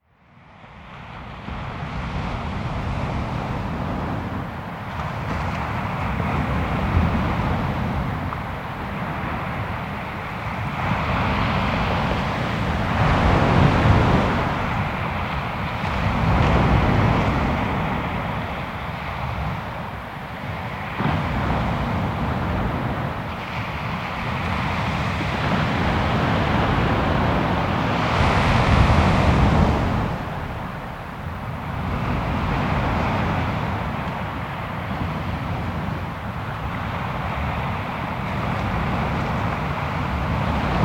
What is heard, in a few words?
Distant Ocean